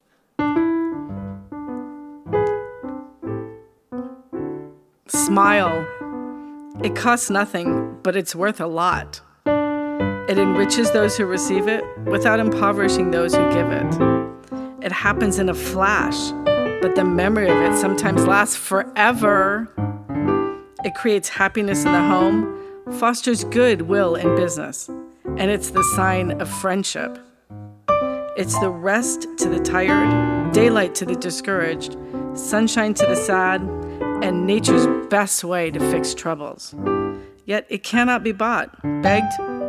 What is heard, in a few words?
Smile with laughter